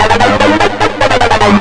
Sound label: Fast Flange Loop; Guitar&Electro